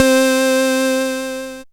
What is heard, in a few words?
c, Euro Style, Dance Synths